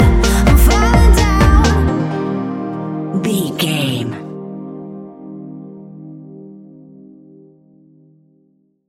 barefoot euphoria stinger, A fast paced piece of club music; perfect for the nightclub, and a youth orientated visual scene